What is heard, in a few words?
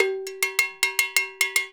FILL, Percussion; InVision Interactive; Percussion Slam; SULTAN